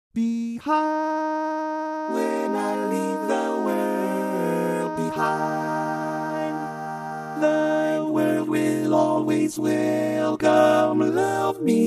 barbershop Lead, tags

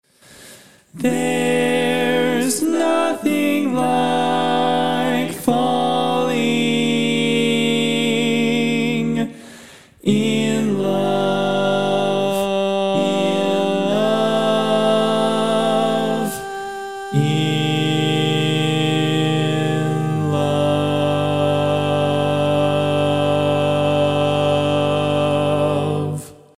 Lead, barbershop

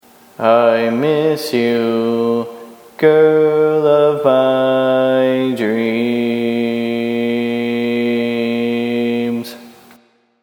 Bass, barbershop tag

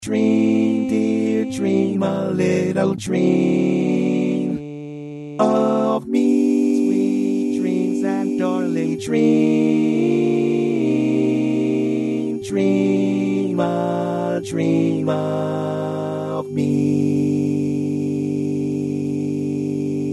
Bari, barbershop tags

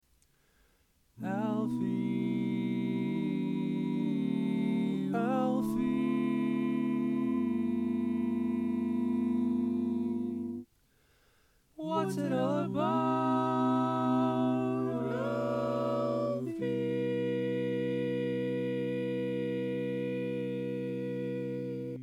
Bari, barbershop tags

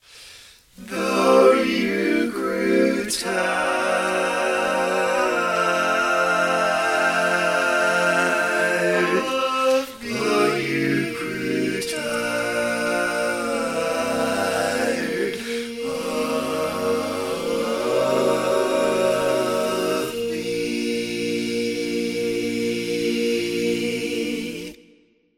All Parts, barbershop

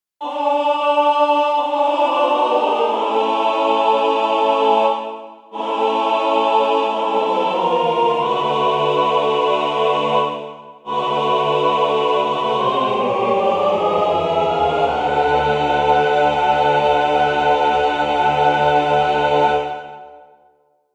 All Parts, barbershop

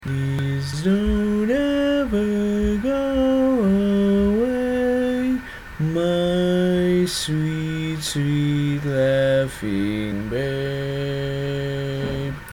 Laughing Babe Bass, barbershop tag

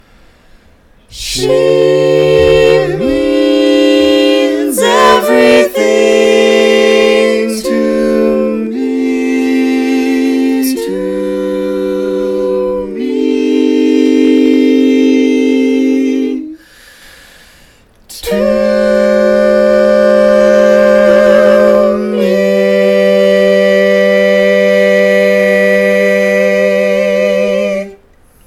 barbershop tags, all parts

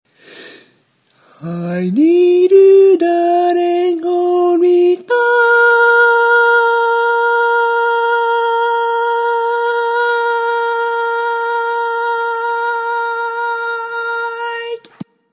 Tenor, barbershop tags

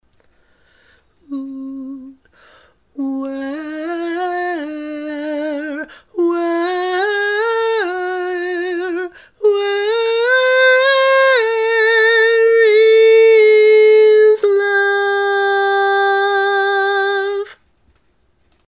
Lead

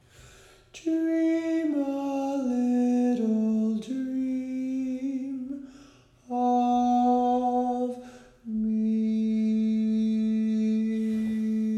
Lead, Barbershop

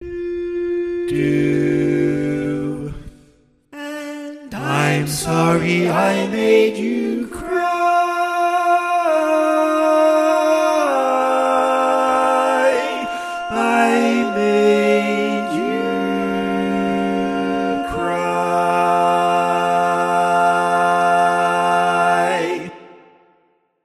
bass

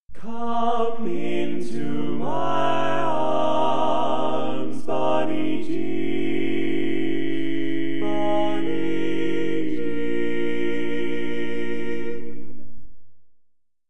Tenor, barbershop tags

